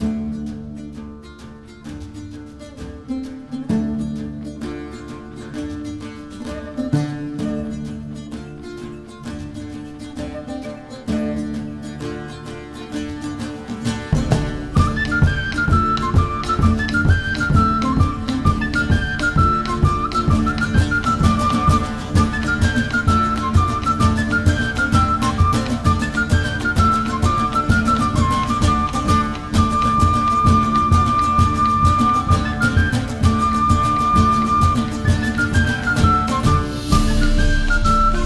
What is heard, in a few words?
Tavern Arranged; Skellige